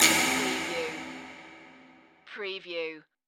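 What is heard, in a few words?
Production Element Hit Scary; Sound Effects Bible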